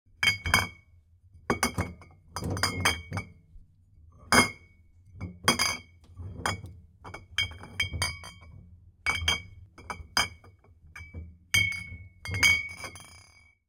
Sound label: Arranging Bottles, Kitchen Sounds; Kitchen Sounds 1.2